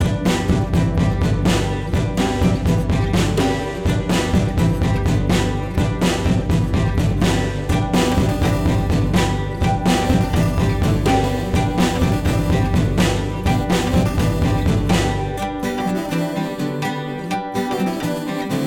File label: song arcade Remix; Octodad Dadliest Catch